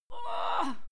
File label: female fight moan pain; schreie; menschen